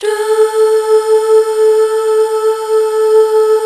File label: HAL DUH F; BACK. DUUHH